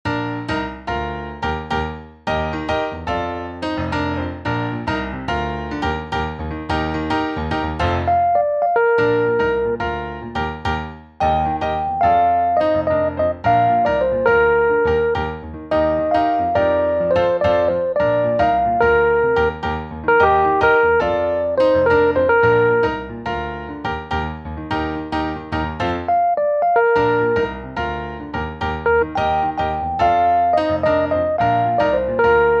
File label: vocal plunk